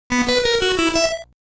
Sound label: INSERT COIN; Darius 30th Anniversary Box